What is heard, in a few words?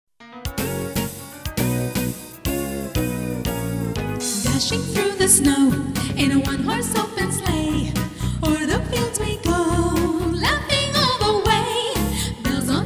Jingle Bell; Christmas